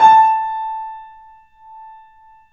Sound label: A -R, The Piano; InVision Interactive - The Piano